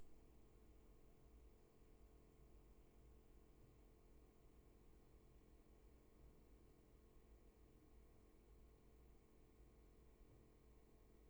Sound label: room tone